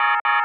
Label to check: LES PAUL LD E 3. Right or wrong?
Wrong